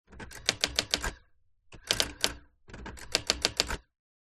office electronic typewriter type correct brother ax 450